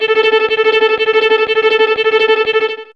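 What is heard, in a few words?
Violin Tremolo A4, Fairlight CMI IIe; TremStr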